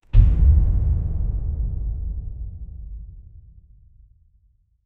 impact, body

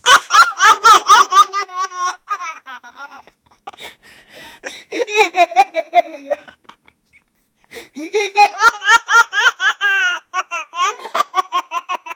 a 18 year old man, family

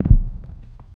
muted heavy footsteps

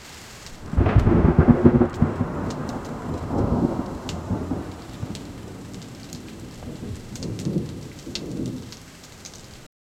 distant thunder trash; comical

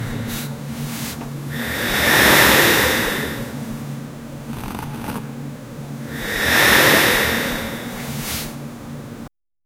a lady woman breathing wh; bed

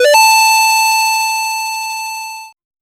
a sound effect when a, 8 bit

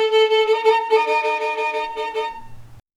violin creaking, string instruments

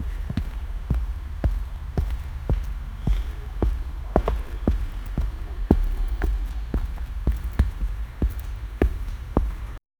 ambient sound of a person; building

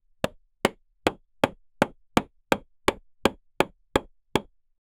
the sound of a hammer, carpentry

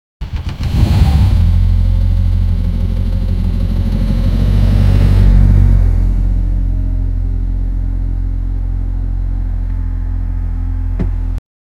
happy background music

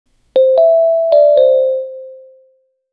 little bing sound; 1 second; ui

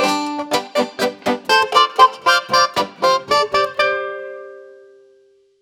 catchy 120 bpm country

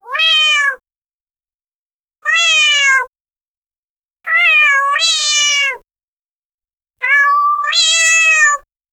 slime cat meow; cats

